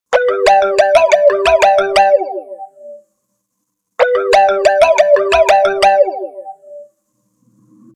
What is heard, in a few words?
space marimba